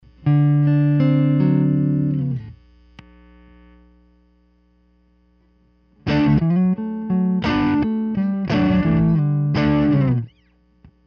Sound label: Pickup switch